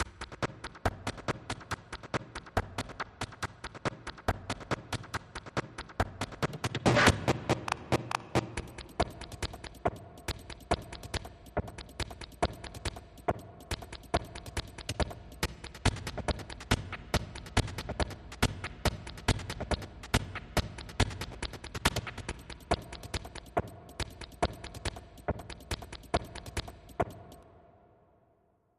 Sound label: Loop Layer Glitch